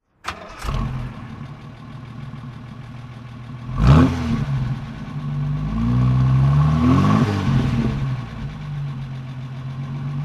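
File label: jaguar Mk V 35 saloon throttle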